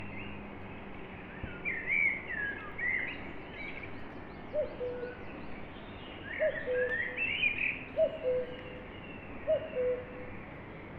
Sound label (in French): Foret oiseau coucou a la fin; Oiseaux